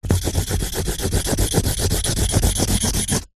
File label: office pen marker large scribble scrub out on cardboard